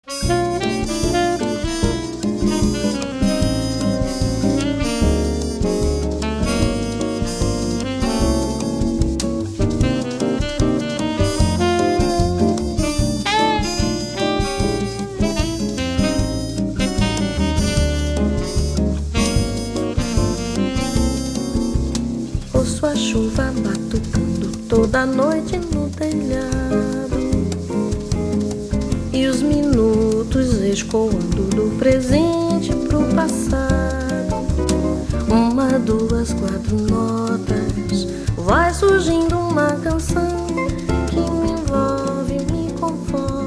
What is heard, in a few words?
Brazilian Cafe